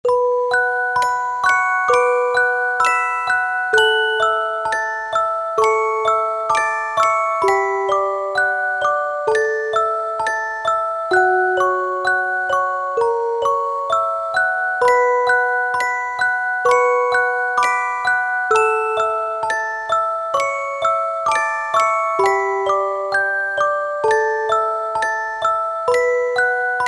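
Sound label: Muzykalnaya shkatulka, music box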